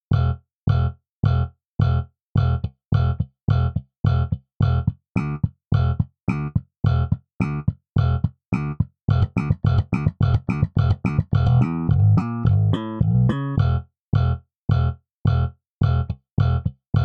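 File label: Thunder Bass Default setting slap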